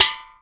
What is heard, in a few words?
bouncy can hit; bouncy objects; Tony Hawk's Project 8; XBOX